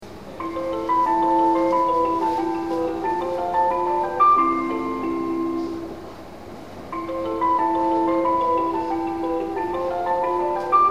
melody, station

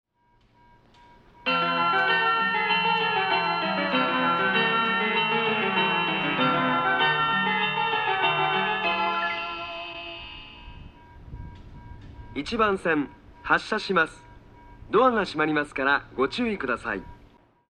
melody, station

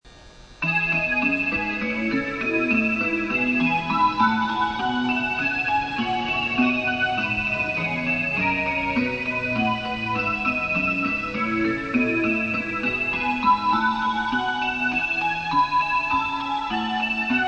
melody; tobu; asakusa

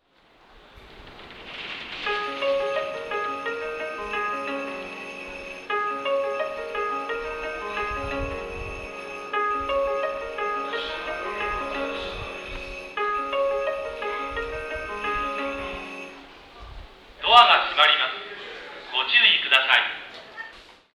melody; 1番線（山手外）発車メロディ; 渋谷駅; jreast yamanote

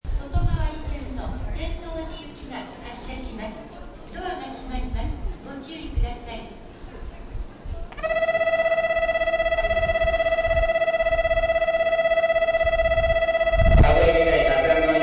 osaka bell, station; jrwest

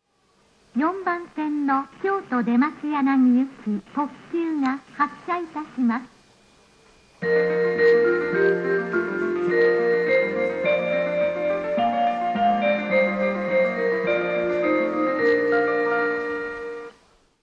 yodoyabashi melody, station; keihan

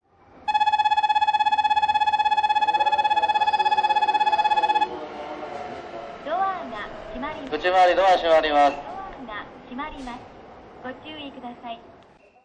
bell, station; shinimamiya; osakaloop; jrwest

